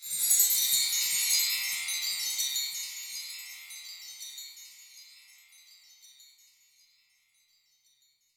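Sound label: Slow Sparkle; 300 Drum Machines; Yamaha DTXpress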